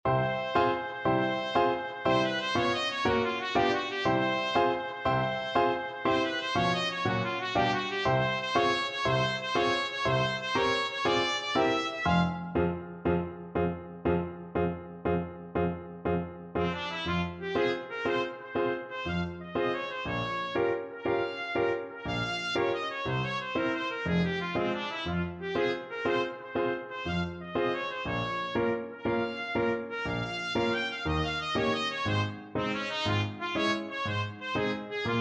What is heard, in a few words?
tarantelle trumpet